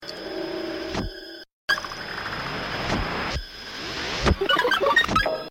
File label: sucked thump